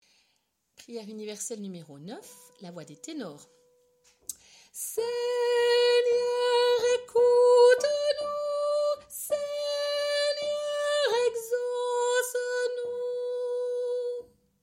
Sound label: tenor